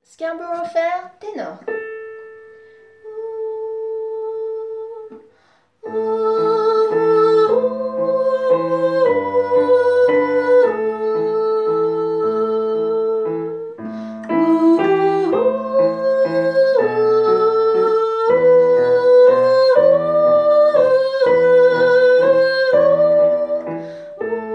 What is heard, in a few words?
tenor